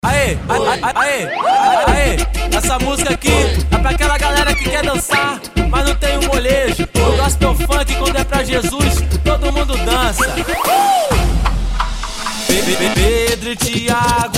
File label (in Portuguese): gospel funk, baile